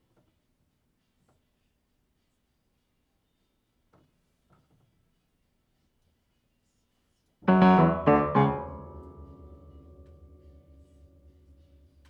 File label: Piano; keys